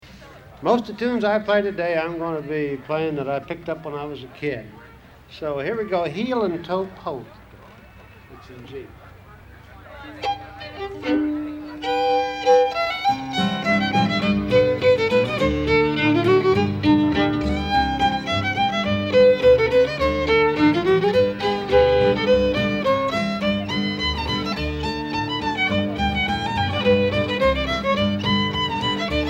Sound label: Heel and Toe Polka; greatlakes; battleground